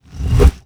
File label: pause fly in; Tony Hawk's Project 8; XBOX